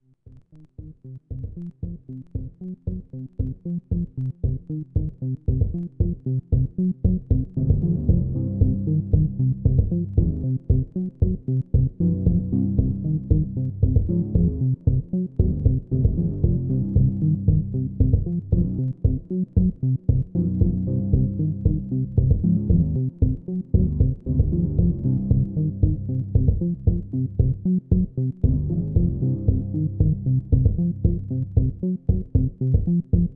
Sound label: calm wave loop